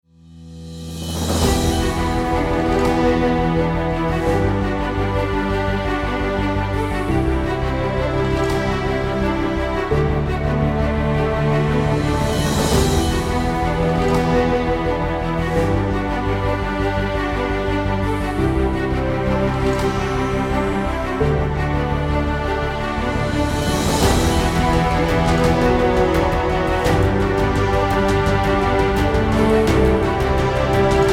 Cinematic Inspire

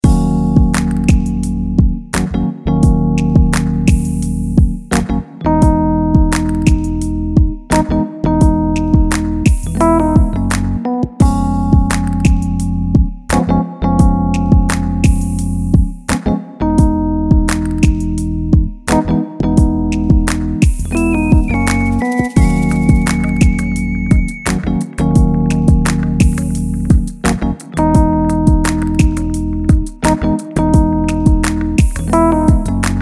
RNB loop

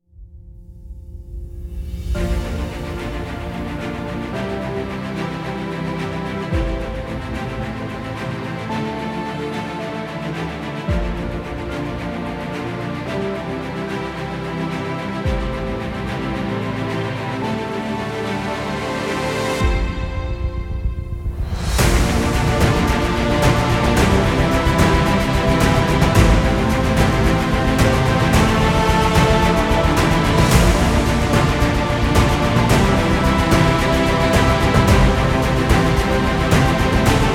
Inspiring Trailer

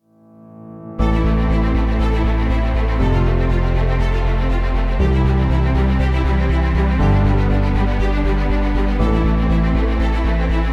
Epic Adventure

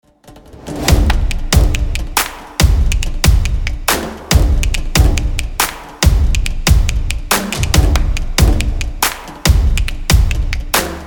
Energy Stomp

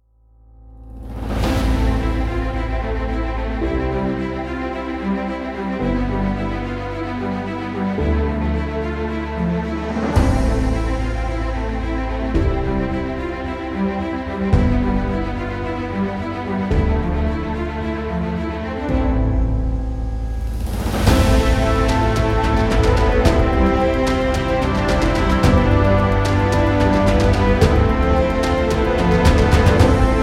Epic Motivation

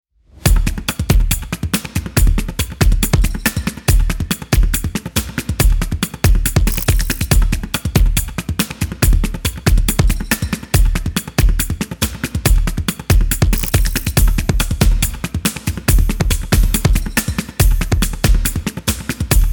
Percussive Energetic

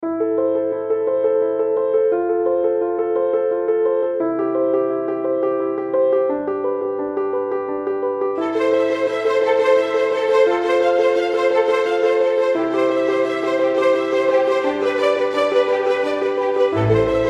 Emotional Cinematic

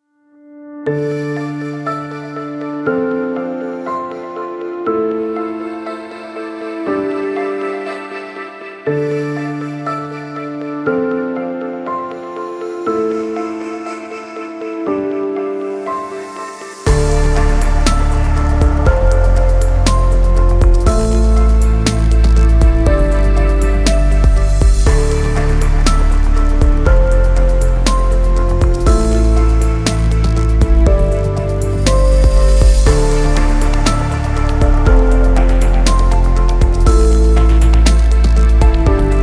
Abstract Background

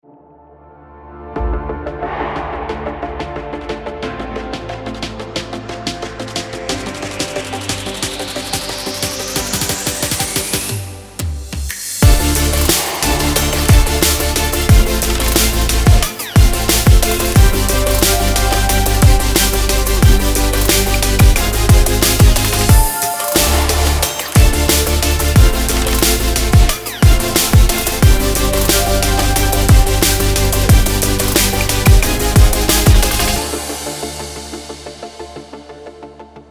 Future Bass Sport

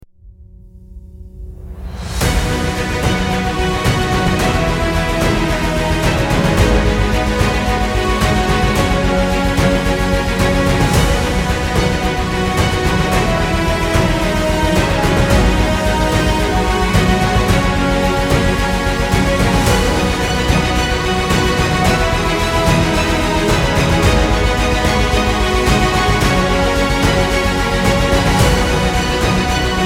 triumphal victory

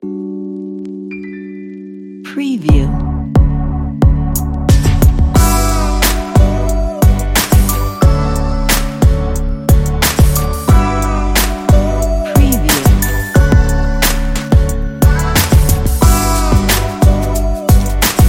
urban opener

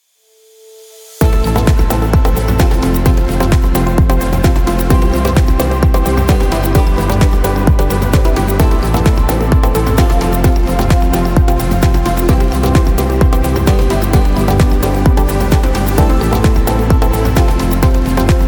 Dance Groove

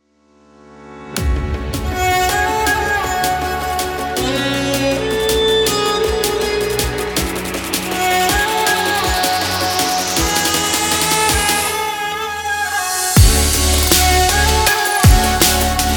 Arabic Future Bass Kit

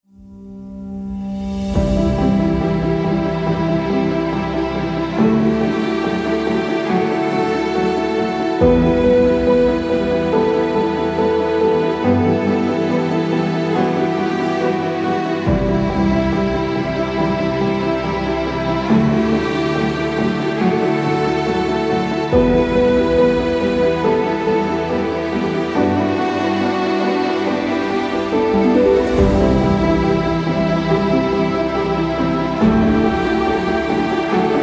Emotional Piano

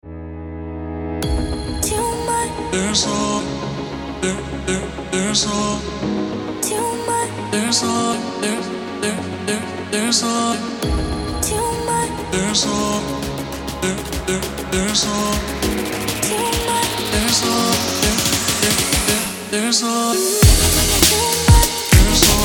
Summer Beat

